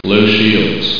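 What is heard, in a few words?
low shield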